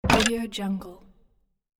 drawer close wooden bamboo; cinematic-sound-design

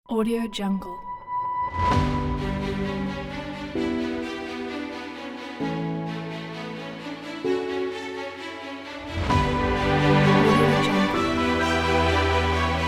epic; cinematicuniverse

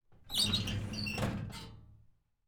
file cabinet drawer; prosoundfx

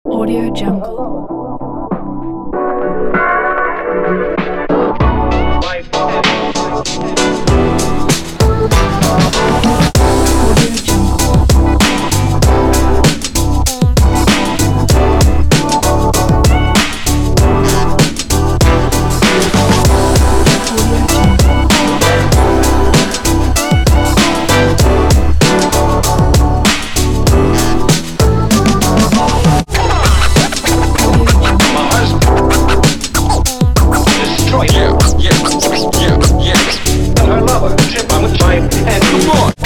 urban chill hip hop

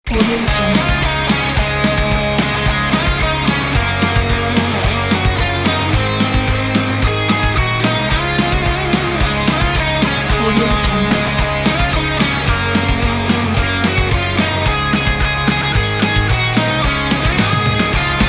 guitar

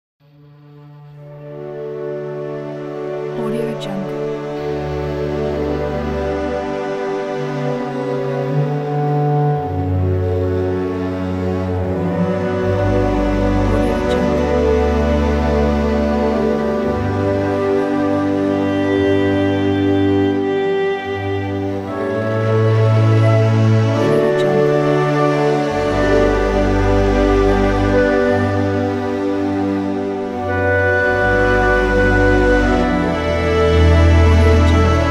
musical poetry

